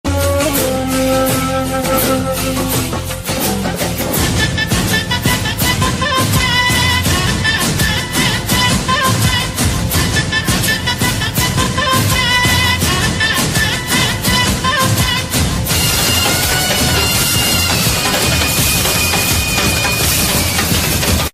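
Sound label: mass background music ringtone tamil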